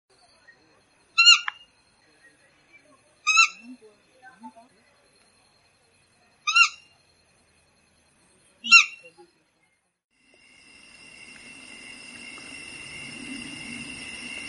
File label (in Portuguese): canto do mocho barrado